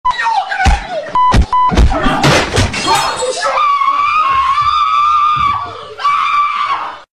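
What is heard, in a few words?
furious screams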